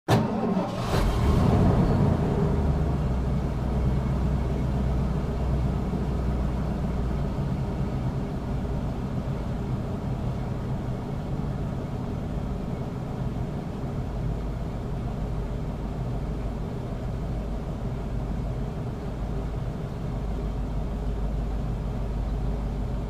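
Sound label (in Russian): внутри грузовика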